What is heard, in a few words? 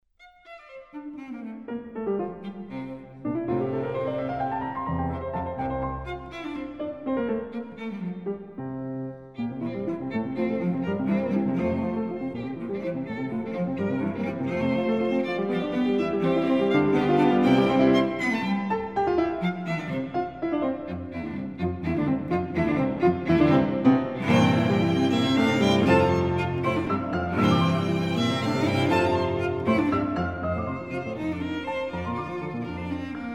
piano trio; volkmann